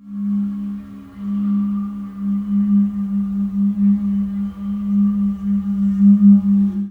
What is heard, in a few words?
ambience